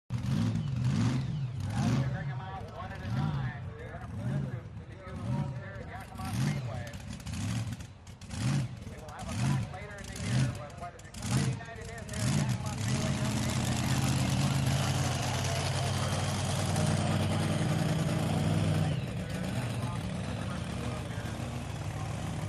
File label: Monster Trucks; Intro Ambience, Pa, Crowds, Monster Truck Starting Up, Idling, Revving, Then Drives Away, Revs And Shuts Off, Crowd Cheers